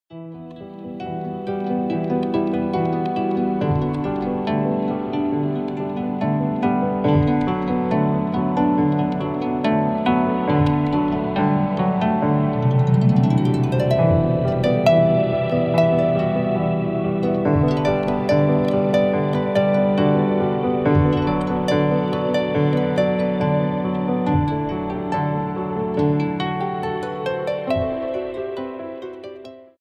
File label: Notes of Harpiano